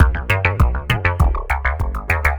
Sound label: (arp) atonal thumper; Sonic Foundry (Sony Creative Software); Ugly Remnants VOL-2